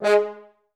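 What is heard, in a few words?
French horn staccato G#2, Advanced Orchestra; Best Service; Peter Siedlaczek's Advanced Orchestra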